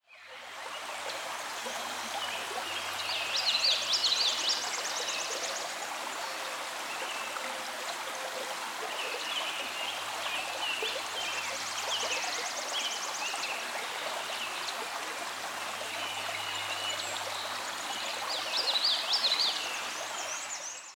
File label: Water Flows Beneath Us